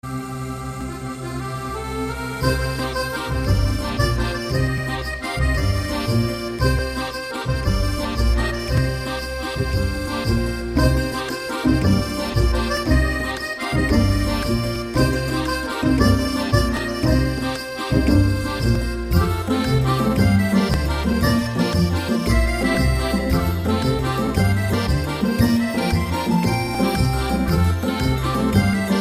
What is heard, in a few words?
arabic